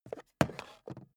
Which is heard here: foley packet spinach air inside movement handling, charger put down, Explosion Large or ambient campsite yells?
charger put down